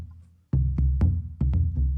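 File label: Calm Far, music; from dust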